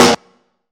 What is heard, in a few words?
airy sizzle snare drum sample a sharp key